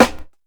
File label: snare drum sound a sharp key, acoustic snare tuned to the A# note